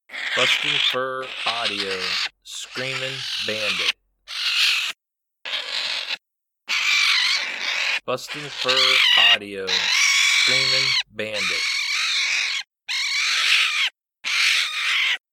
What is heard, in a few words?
Juvenile Raccoon in distress.; BFA Screamin Bandit